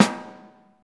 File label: boom acoustic snare g key; snare drum samples